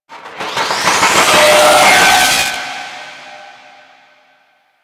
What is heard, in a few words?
Sabotage Power Cell Rattle; Warframe; Ambience; Space Battles